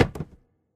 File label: phys cube, physics